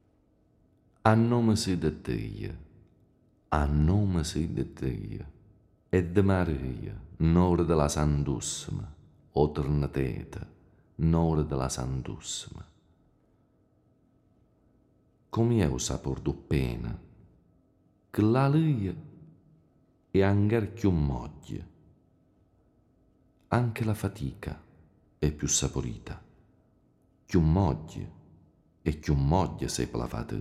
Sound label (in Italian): Canto sull'aia